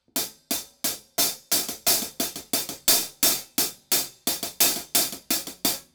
Hihat Drums Overheads; Kelvin; Helping Meh

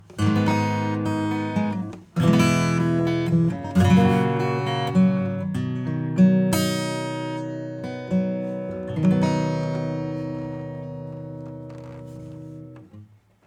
acoustic bypass

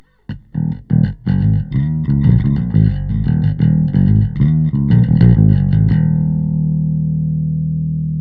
Bass

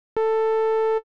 FILTER; Pigments; Jup 8

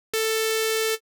FILTER Phaser; Pigments

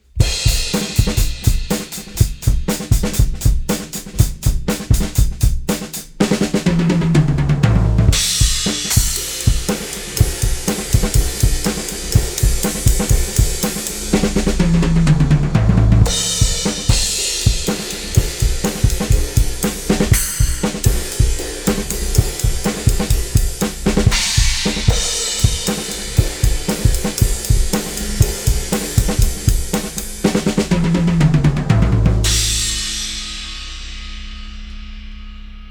drums; Gem EQP

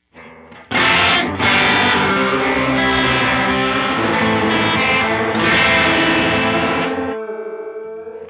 Moogerfooger MF-108S CHORUS LFO sine

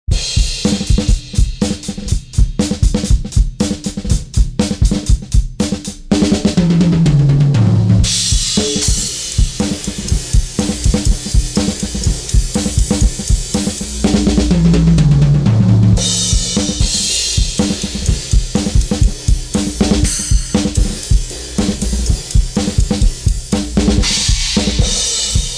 EQ 250 Bell cut; Kirchhoff

